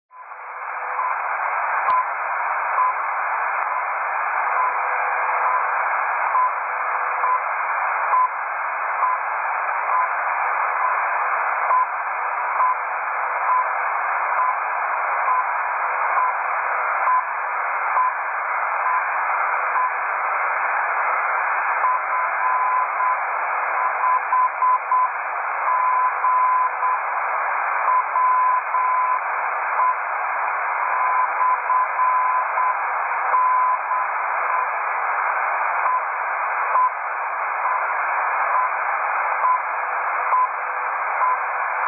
beacon; f5zwe

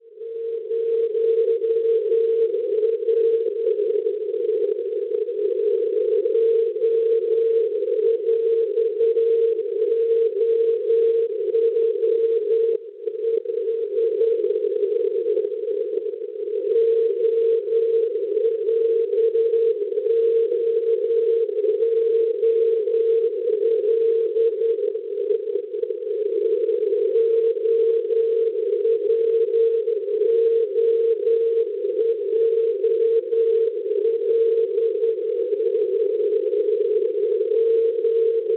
NDB, 415 kHz; OL; Linkoping; Svezia; Schwarzsee

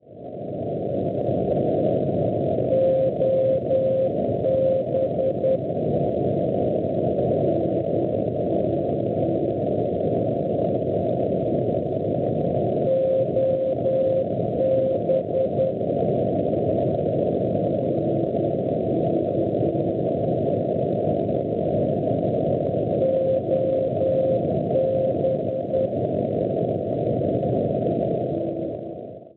NDB, 395 kHz; OB; Marsiglia; Francia; Schwarzsee

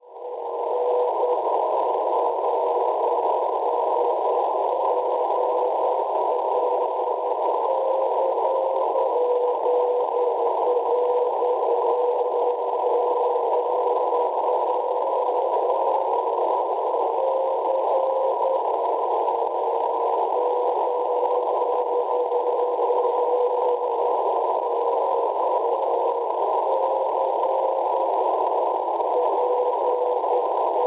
NDB 410 kHz; La Coruna; Spagna; Schwarzsee

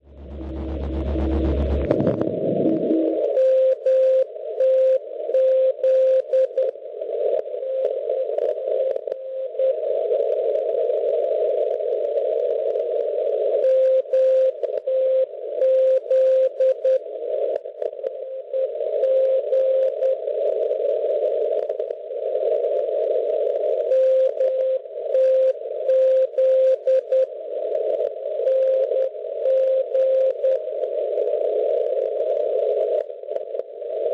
NDB, 354 kHz; MTZ; Metz; Francia; Schwarzsee

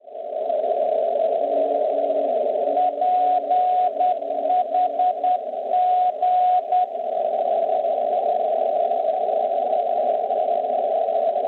NDB Phalsbourg Francia 424 kHz; PHG; Schwarzsee

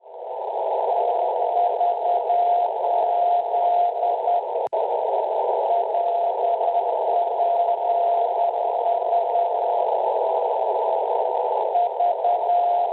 NDB 403 kHz; VZ; Vichy Francia; Schwarzsee

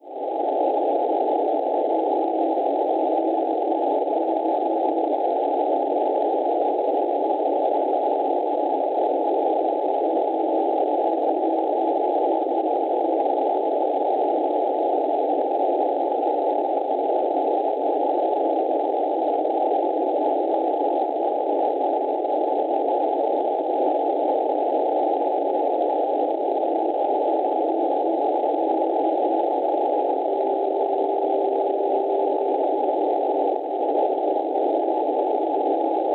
NDB Arad Romania 517 kHz; ARD; Schwarzsee

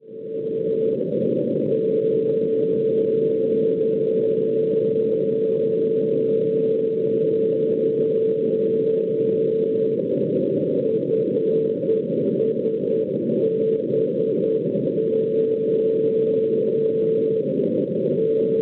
NDB 284 kHz; FSB; Fassberg; Schwarzsee

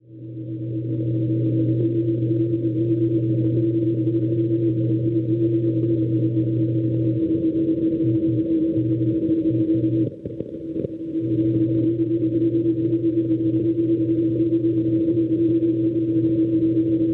NDB Istres Francia 390.5 kHz; ITR; Schwarzsee

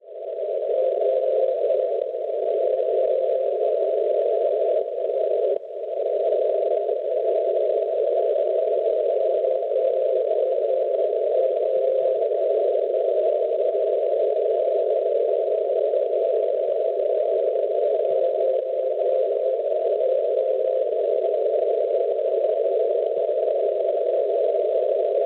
NDB, 514.5 kHz; LA; Namest Nad Oslavou; Repubblica Ceca; Schwarzsee

